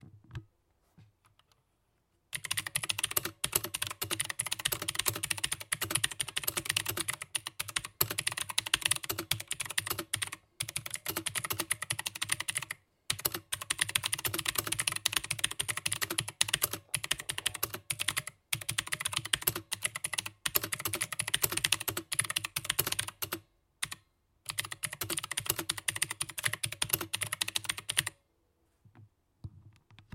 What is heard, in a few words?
KM-G16 sound; Aukey